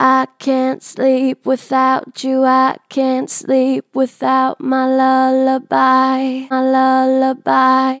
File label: Vib I cant sleep without you, Vocals, Natural Vibrato Strong; Harmor Vocals